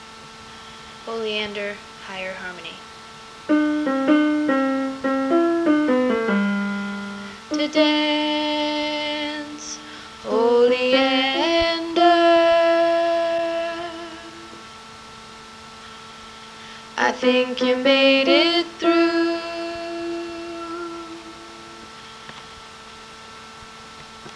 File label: High Chorus